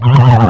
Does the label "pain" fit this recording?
Yes